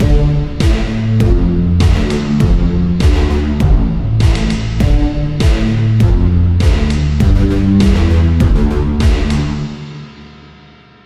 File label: Antag Stinger; Revolutionary